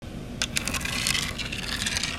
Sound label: Sliding